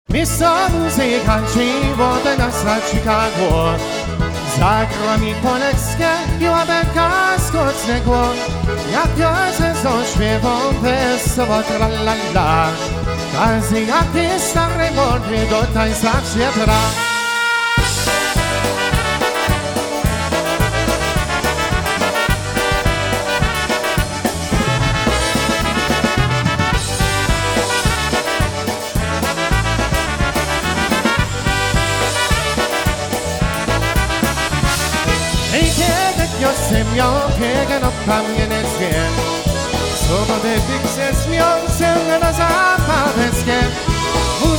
Polka, Live Jam

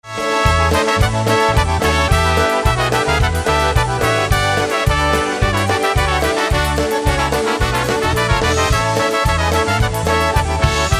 Little Bit Gypsy Polka

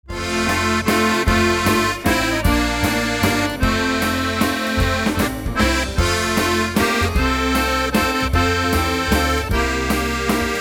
Waltz Medley

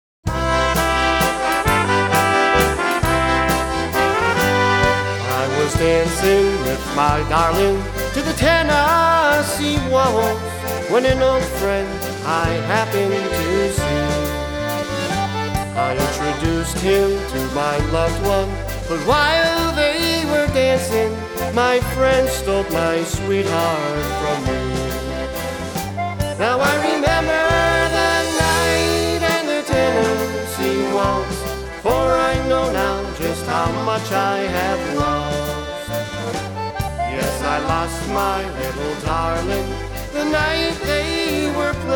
Waltz

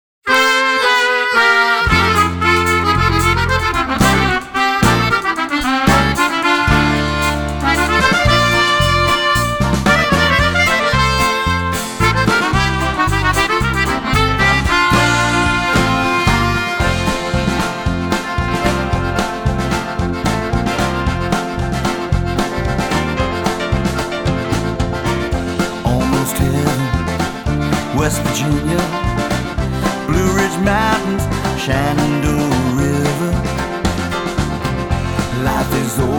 Medley

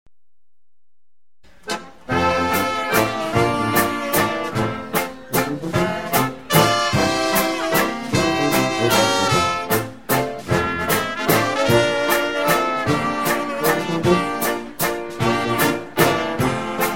Waltz

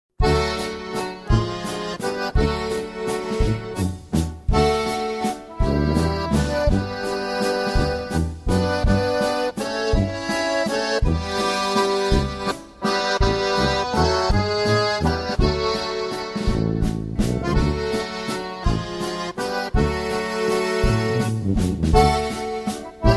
Waltz

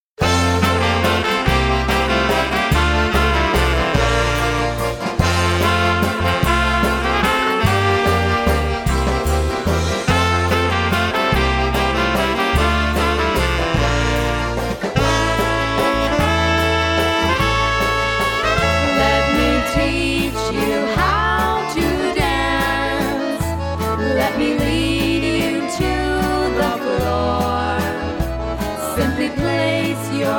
Waltz

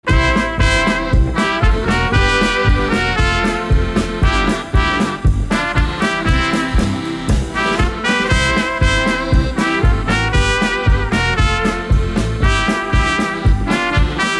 Owl Polka

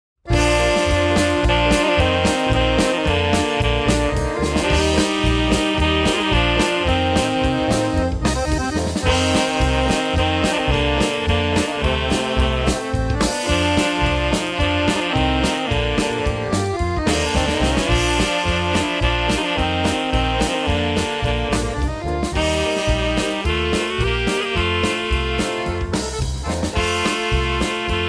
Plow Polka

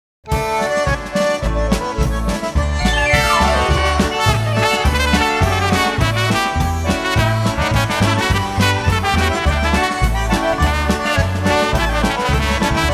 Concertina Polka

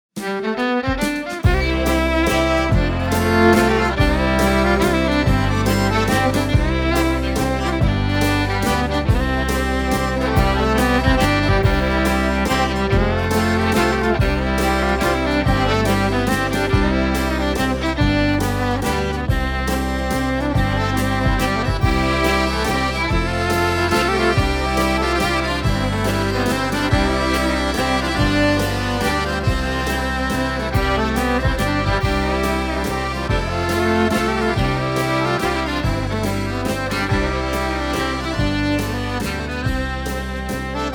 Waltz

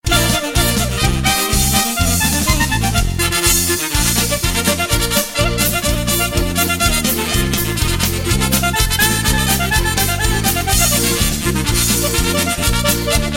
Dziadunio Clarinet Polka

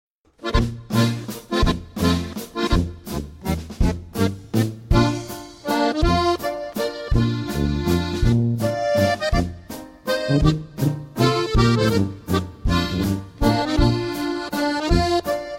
Waltz

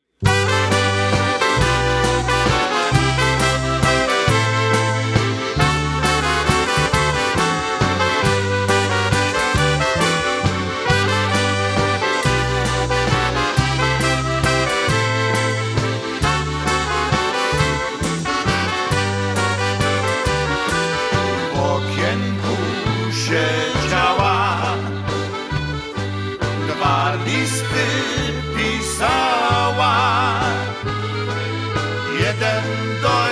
Sitting At The Window Waltz

